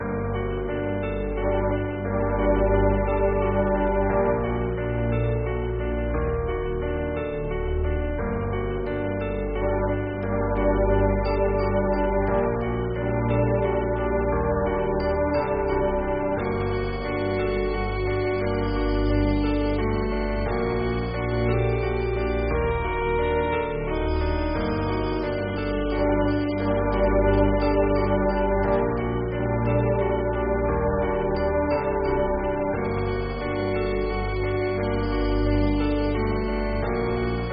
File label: Dungeon